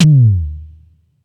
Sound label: Tom, Ensoniq ASR-X Pro